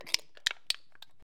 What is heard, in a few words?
soda can twist; soundscrate